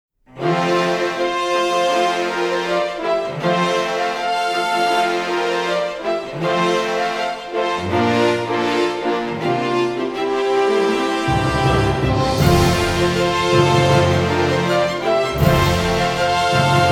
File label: victory; Sword Art Online